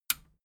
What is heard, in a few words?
metal button radio push enable